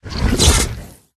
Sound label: jelly fish attack voice up